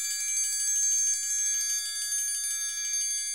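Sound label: TRIANGLE roll, Synclavier Percussion; ILIO; Synclavier Percussion 2; World & Orchestral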